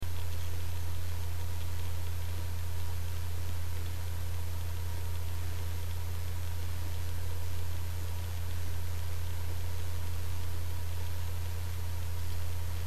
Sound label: maxtor big, hd; hardware